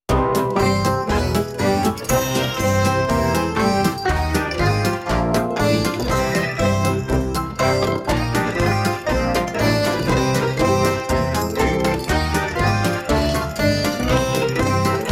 a campy quirky italian flavored cue with a circus comedic vibe